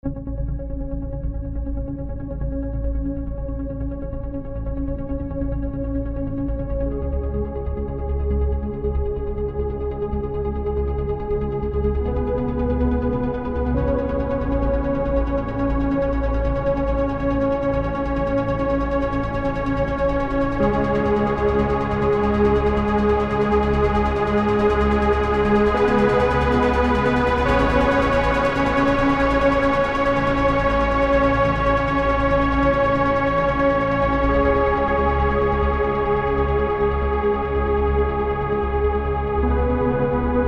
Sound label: pads layered